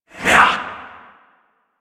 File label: Possessed Red Veil Vocal Attack; Enemies; Warframe; Priest Quest